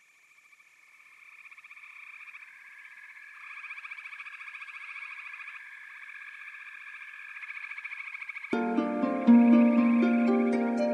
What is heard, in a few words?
foggy fields; relax with nature